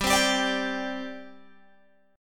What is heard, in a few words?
G Suspended 4th, Guitar, Open G